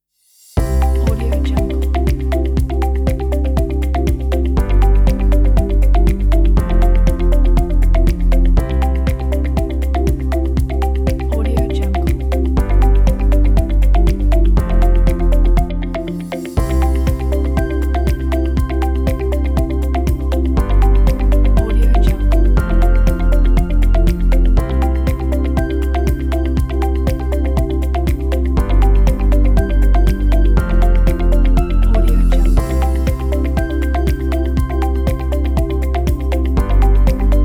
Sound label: technology corporate